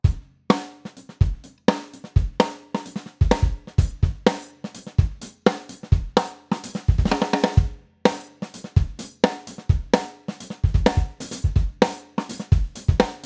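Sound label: DRUMS reverb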